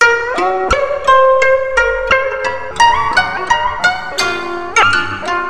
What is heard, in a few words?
KOTO LP FX, Asian Traditions; USB Soundscan vol.60; AKAI; KOTO FXLP